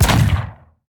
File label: heroes mine Drop explosion